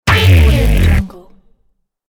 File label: electric breakdown